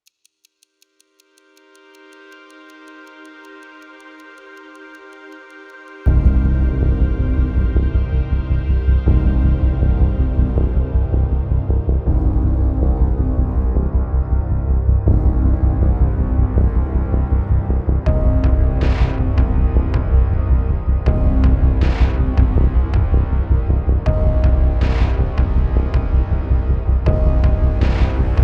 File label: Reaching The Peak, Epic Hybrid; Narrative Mind